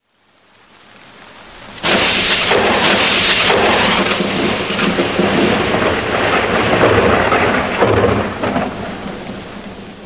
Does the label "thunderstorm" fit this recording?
Yes